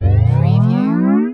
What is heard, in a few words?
Stretch Ascend